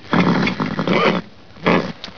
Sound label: nicker